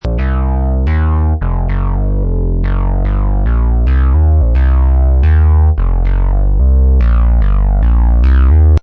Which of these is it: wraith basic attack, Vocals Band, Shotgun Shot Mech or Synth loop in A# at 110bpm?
Synth loop in A# at 110bpm